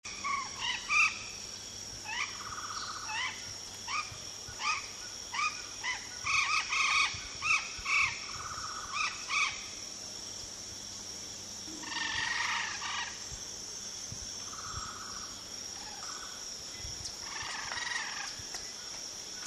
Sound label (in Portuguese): Canto da Ararajuba